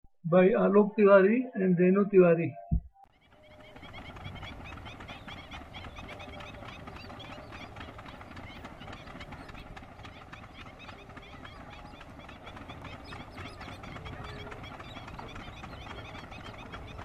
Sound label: Black-winged stilt; alok